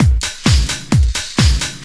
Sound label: drum 130 straight; Techno Trance; DRUMLOOPS; Techno Trance Essentials